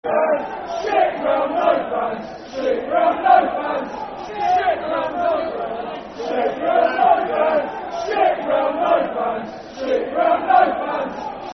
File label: No Fans, Football Chant (Soccer Song); Brighton Bhafc